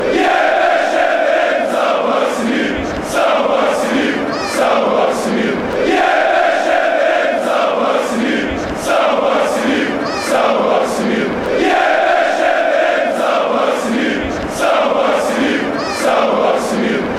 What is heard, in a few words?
dinamo zagreb chant